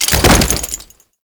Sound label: Death Body Fall; Royal Guard; Foley